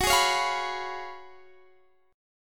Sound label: Gb Major 7th Suspended 2nd Suspended 4th, Guitar Half Step Down